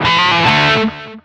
Pickup Guitar Riff; SFX Kit; Pickups

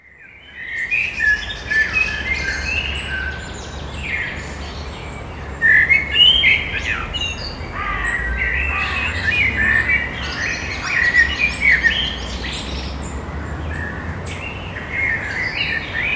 birds in the evening; best service Blue Box

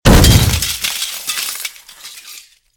glass fall; firefight

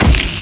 Melee Magical Medium; Kilta

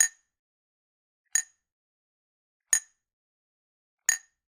Generic Click Glass Tiny; boom library; The Interface

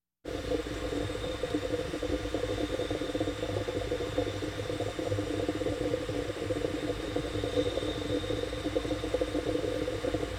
Hospital effects

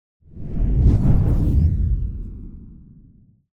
Whoosh Soft Explosion High Sub Energy; Hollywood Edge; sound designer's toolkit v2

